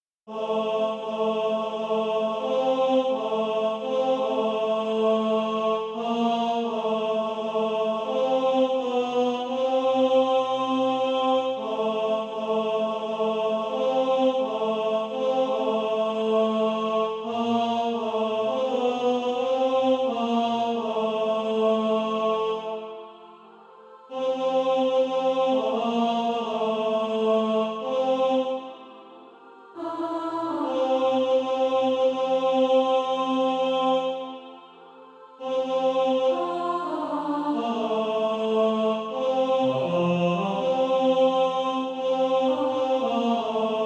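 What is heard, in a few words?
tenor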